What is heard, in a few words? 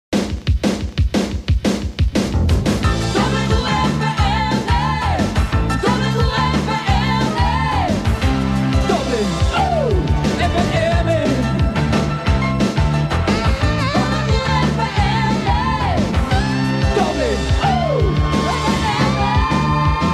Jingle, radio